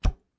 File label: bow shot